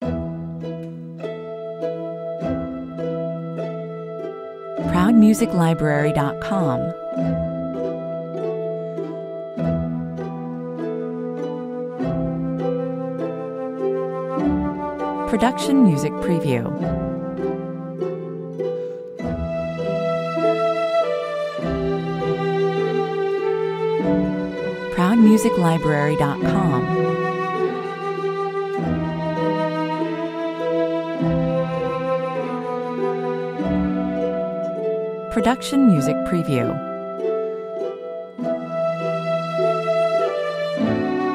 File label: 100 bpm, A mid-tempo, melancholy and pensive live chamber orchestra piece